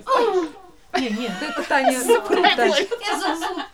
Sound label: NOISE, CLASSIC VOX; Best Service; Hallelujah